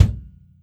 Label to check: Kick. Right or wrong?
Right